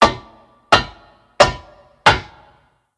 Ladder; blue archive

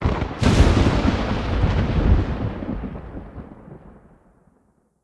Thunder; blue archive

